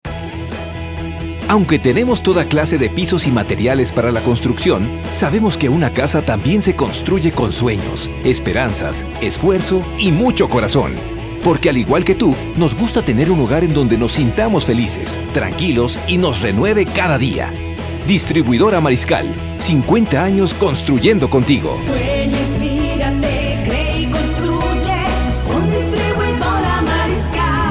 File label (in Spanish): Jingle; Distribuidora Mariscal